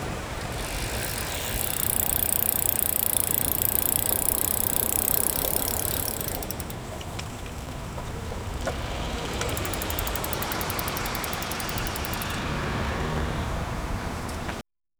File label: man riding a bicycle on